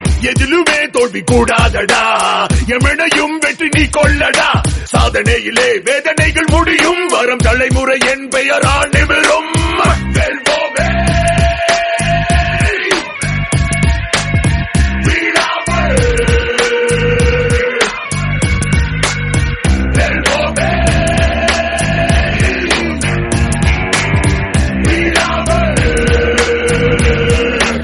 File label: brave rap, ringtone; aadukalam